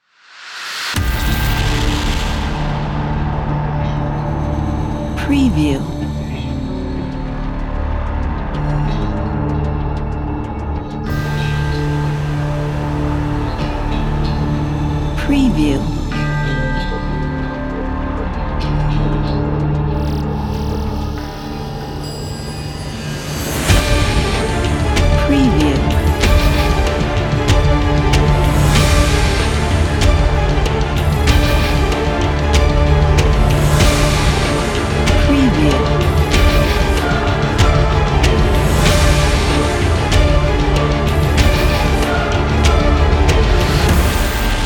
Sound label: scary dark trailer